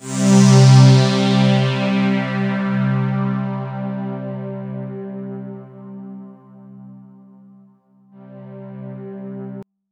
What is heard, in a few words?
C2, Roland JV 2080, POLY SAW